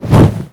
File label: wing flap heavy; dota2